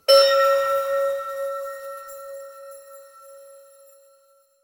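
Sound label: approval sound effect positive; traditional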